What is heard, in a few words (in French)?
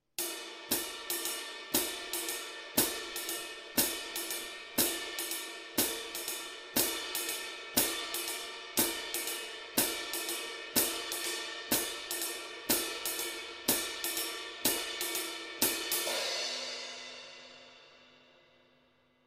rythmes incontournable, batteur